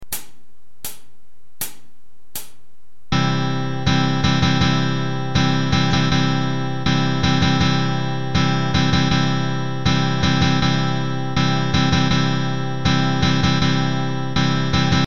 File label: Strumming Exercise, guitar